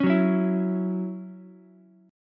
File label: Db Minor Double Flat 5th Db Ukulele; strummed; Dbmbb5; JGuitar